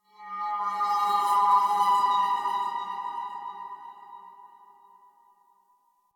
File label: Texture Interior Medium, Ambience